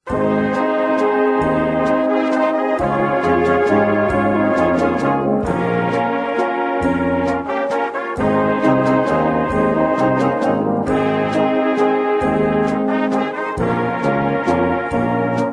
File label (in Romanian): Fanfara